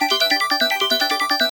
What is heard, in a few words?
ui loop shiny